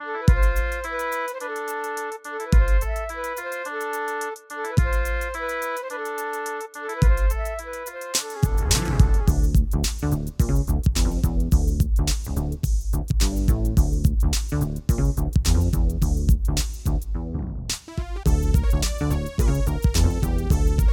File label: Casio CTK-800; Lost Vikings